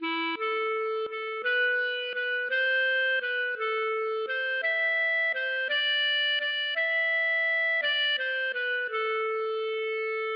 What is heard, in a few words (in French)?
valse